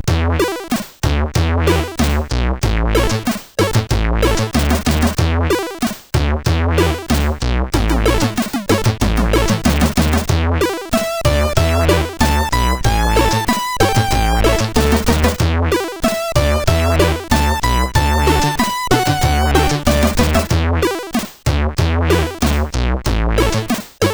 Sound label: Intro, sid CSG8580R5; Contex